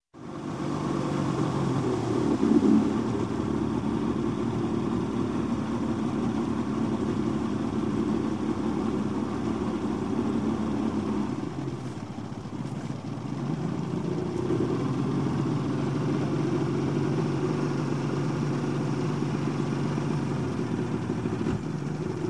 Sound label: Boat Outboard, 50 Hp, Drive Along, Up To Dock; 150 Hp; Shut Off; Sound Ideas; Series 2000